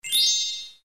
chime; collect

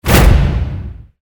close box; moneybank

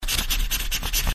Scratch Sound; free bonus

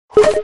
info page scroll

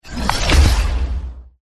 pick open

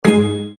wheel end

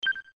Autospin

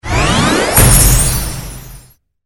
box rotate; moneybank

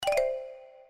lobby menu drop down

